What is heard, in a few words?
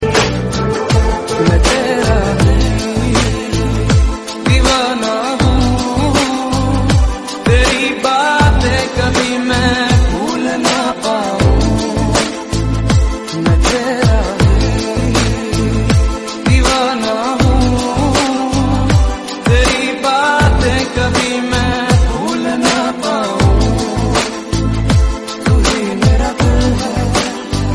sad song